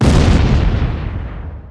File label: cannon; Crazy Machines; Играет вся семья